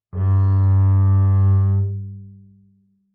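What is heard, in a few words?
Bass arco fortissimo sul E A2, Double Bass; MIS Pitches - 2014; Strings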